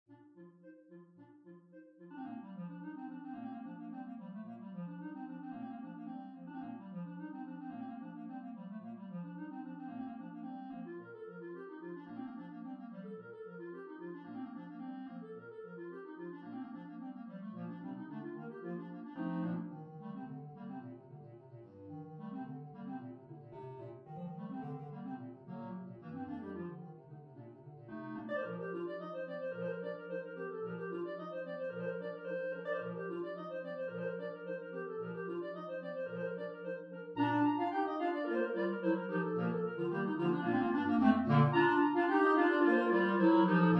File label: Clarinet Quartet; Grainger; Molly On The Shore